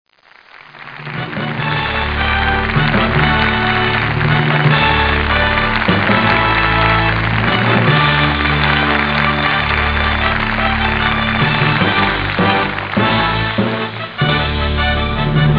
Finale End Music; Let's Discover Michigan!

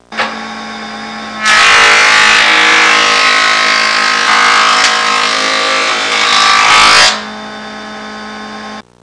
wood planer; Sound Library 2000; Wayzata Technology; Household

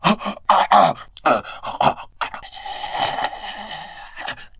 choke

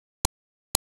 Tick; chesstal

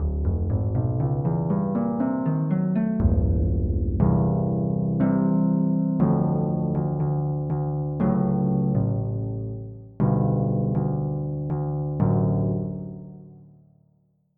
Rodez Harp

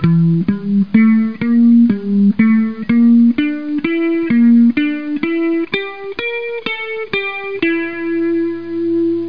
E Minor Blues; SuperLicks 2.0.1

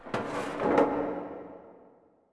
amb hangar misc; Earth and Beyond; EA Games

